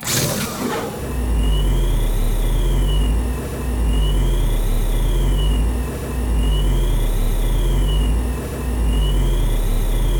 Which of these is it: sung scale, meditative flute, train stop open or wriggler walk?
train stop open